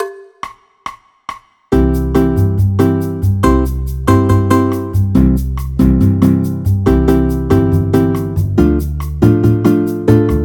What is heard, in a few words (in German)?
c dur stufentonleitern